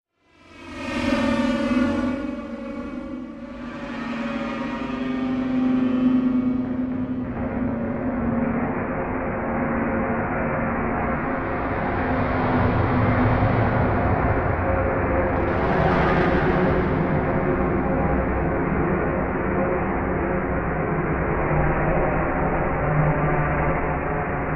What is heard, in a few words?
Slow Pitch Drop; Module Production Element Title Imaging; Imaging Elements